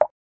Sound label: water droplet; ion sound